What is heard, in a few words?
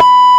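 B3 natural harmonic, guitar; AKAI S-Series CD-ROM Sound Library VOL-8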